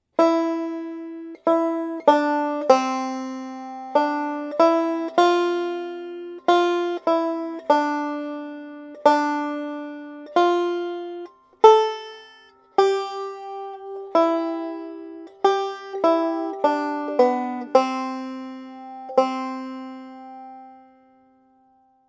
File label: Melody; Lynchburg Town